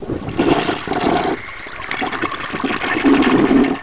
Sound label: flush